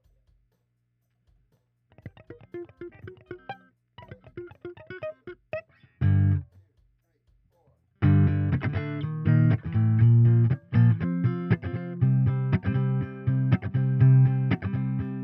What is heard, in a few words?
Rhythm